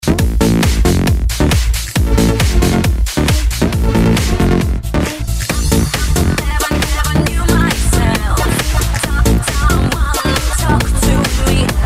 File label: electro house e dance